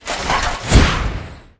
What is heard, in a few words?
death soldier attack